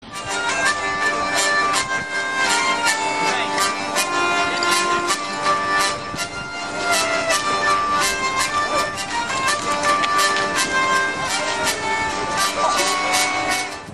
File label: hurdy gurdy; pennsic 2008